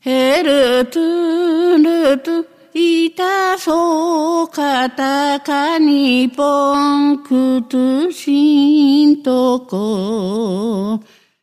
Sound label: Unused Man-Bat Chant; Elden Ring; PS4; Xbox Series X/S